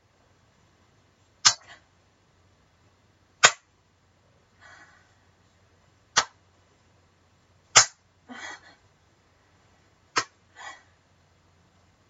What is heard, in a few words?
spanking noises